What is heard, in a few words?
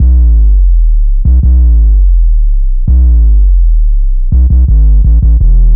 Hard Sweep Down, Bass Lines 166.5; Jungle; Zero-G Jungle Warfare 1, 2 & 3; 90 s Sample CDs